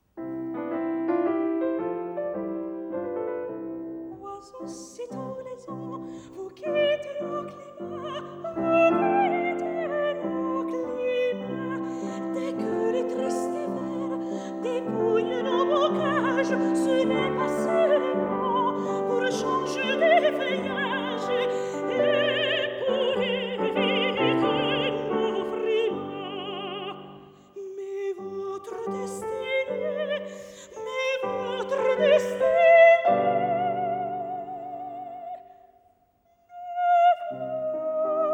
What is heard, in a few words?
song for voice & piano; Oiseaux, si tous les ans